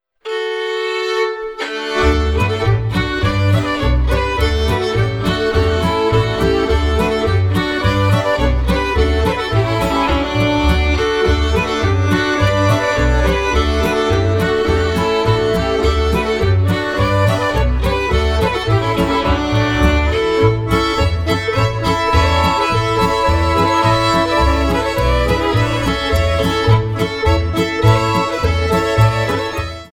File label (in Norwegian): Hoppvals